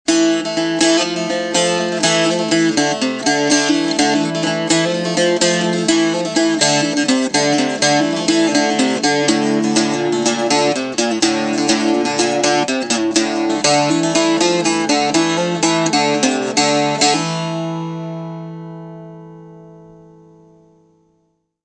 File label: bouzouki; musical instruments